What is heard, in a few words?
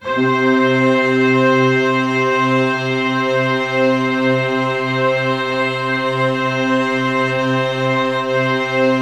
Band Orchestra C6, brass; Giga Samples Collection